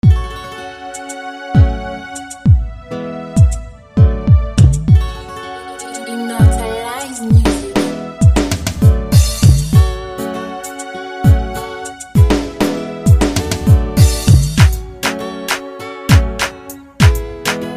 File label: Afro Beats